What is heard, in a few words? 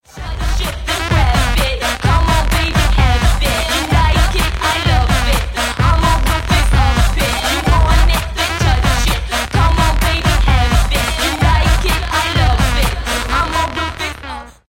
hard electro